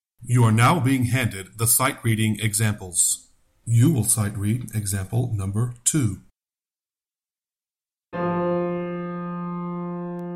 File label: Sightreading Example Bass